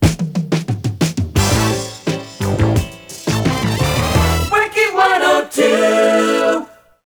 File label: wack funky